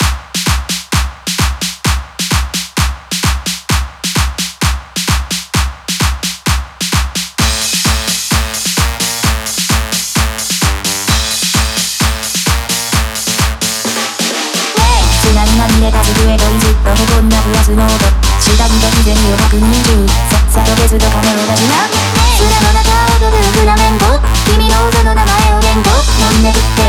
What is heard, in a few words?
BRASS NOISE FLAMENCO